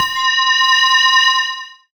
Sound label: Ambient Atmos, Dance Synths